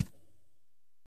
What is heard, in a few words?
reel Stop; fairyhansel